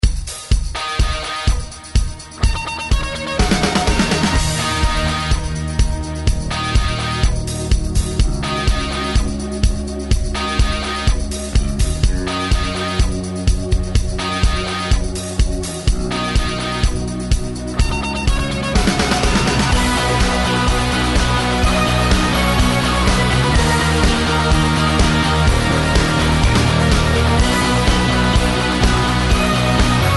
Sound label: Dance Floor Anthem Intro Rock; remixplanet